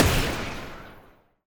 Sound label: Bang Short